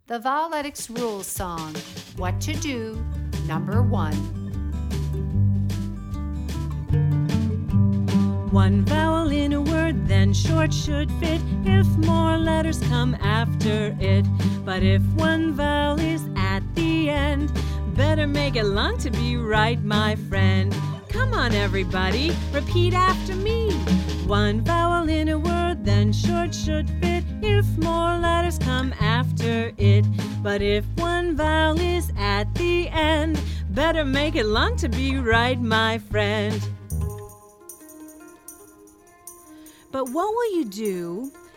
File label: sing